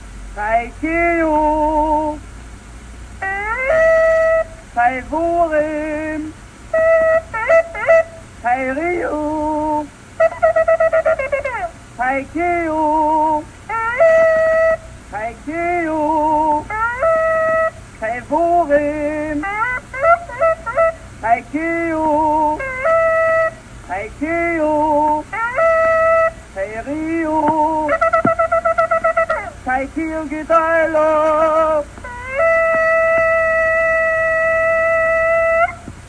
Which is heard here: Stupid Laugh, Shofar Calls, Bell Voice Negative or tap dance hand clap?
Shofar Calls